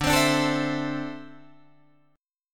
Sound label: D 7th Suspended 2nd, Guitar, Drop D